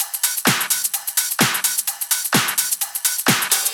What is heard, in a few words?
Loops 128BPM, Drumloops